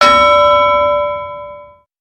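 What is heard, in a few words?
bell buoy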